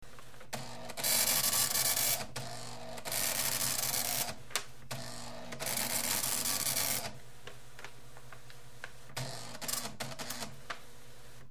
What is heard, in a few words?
printer